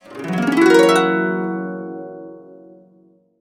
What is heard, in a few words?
Complete Harps; USB Soundscan vol.62; AKAI